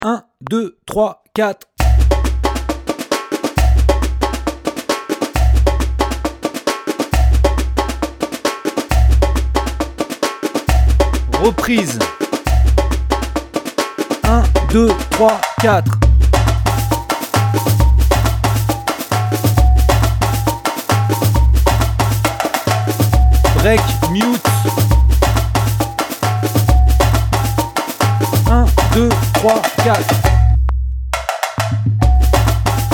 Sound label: funk 150 sans surdo